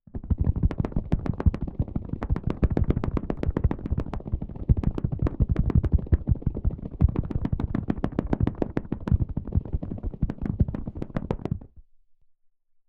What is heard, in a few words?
Couch Rumble